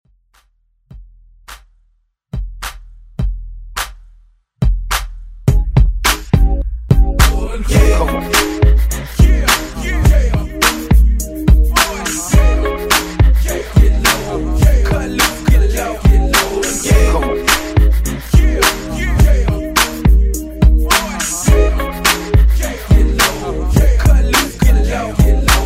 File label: Throwback Quick Redrum